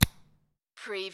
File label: Lego, connect two lego pieces